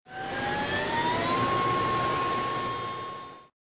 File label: pool cleaner